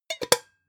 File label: pot lid close plop